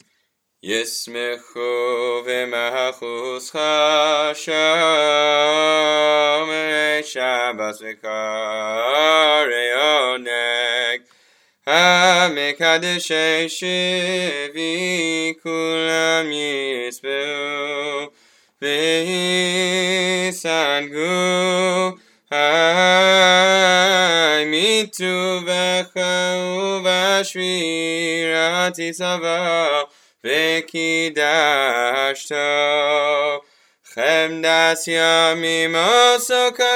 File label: Yismichu Classic Melody; Musaf